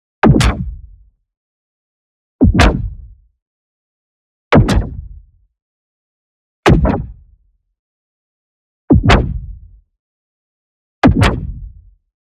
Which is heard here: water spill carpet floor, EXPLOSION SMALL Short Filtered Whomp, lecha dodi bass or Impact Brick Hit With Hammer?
EXPLOSION SMALL Short Filtered Whomp